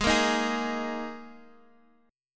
G sharp Suspended 2nd, G sharp, down, Guitar, Half Step Down; G#sus2